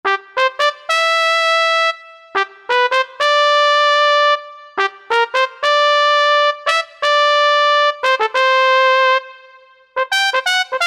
Trumpet

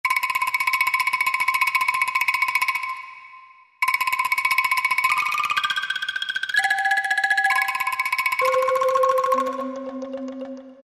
ETHNIC - ANGKLUNGS; Spectrasonics Heart Of Asia

